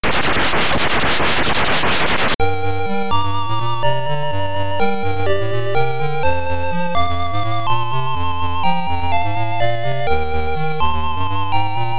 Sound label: music